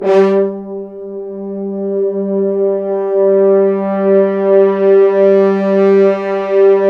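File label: French Horns sfz; Roland L-CDX-03 Disk 2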